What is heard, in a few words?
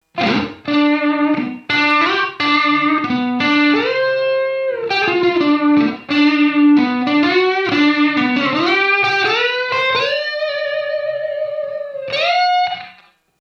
Room